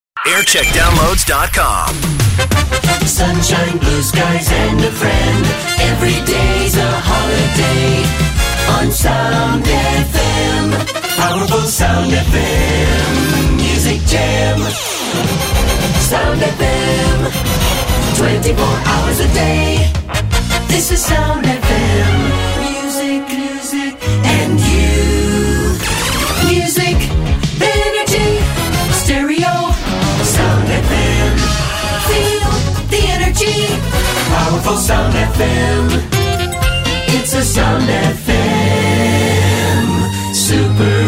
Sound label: Jingle Montage